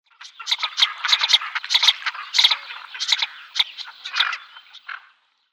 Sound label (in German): Loeffelente Anas clypeata